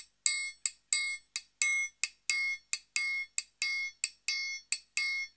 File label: 089 FREES, Modern Percussion Loops; USB Soundscan vol.56; AKAI